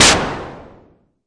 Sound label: mbrute noiz, Arturia MiniBrute; DRUM MACHINES